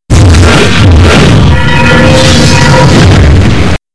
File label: iris close